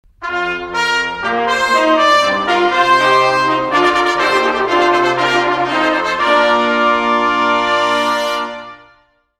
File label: music